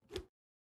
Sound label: move; twenty48 solitaire